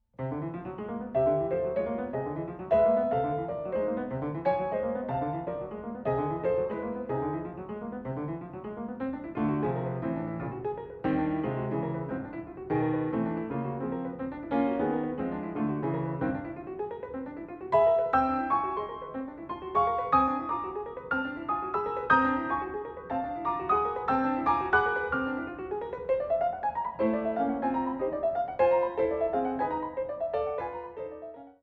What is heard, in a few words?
Fanfares; Ligeti